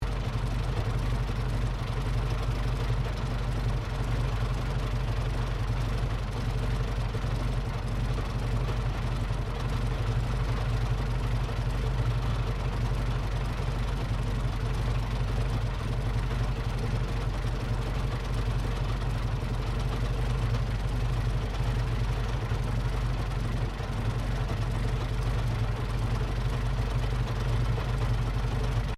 TANK CENTURION PRIMARY ENGINE IDLE, British Centurian main battle tank; Trucks; Vehicles; Foundation4